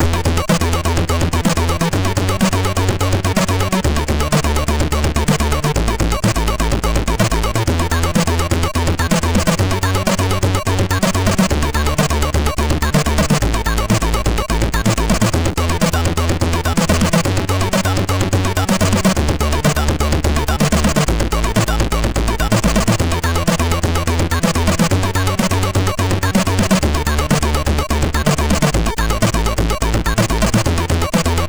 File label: Children Songs, MOS6581R3